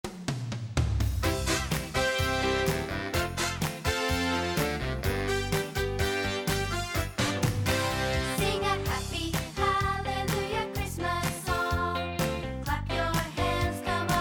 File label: Happy Hallelujah Christmas Song